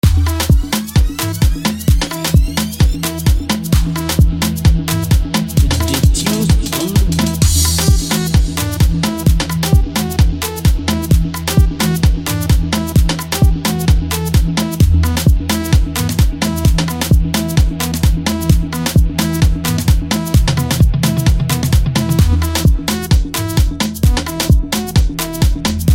Instrumental; vibes from agege

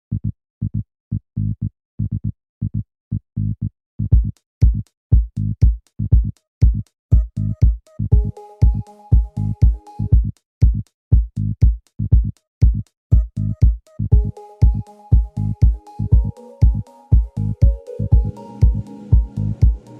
Free Dub Techno Beat

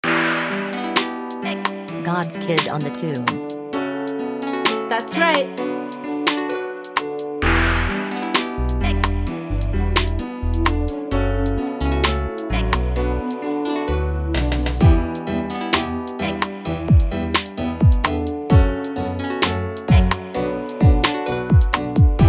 Type Beat, Afro melodic instrumental

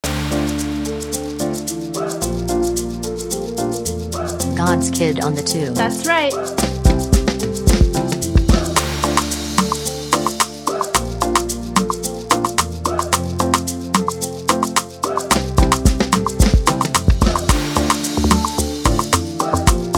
Type Beat

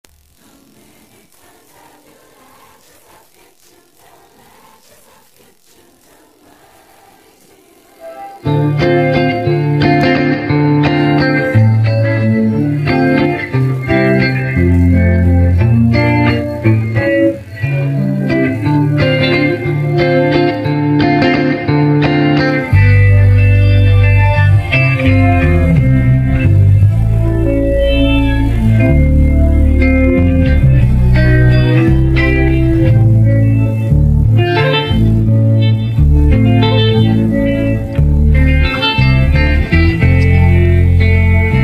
Instrumental

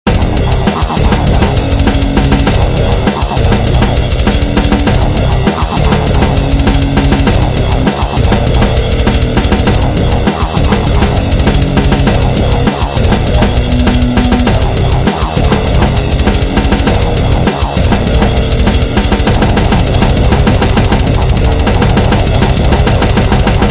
Cybergrind Beat

